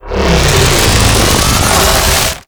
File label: Sentient Vessel Laser Beam; Warframe; Tau; Abilities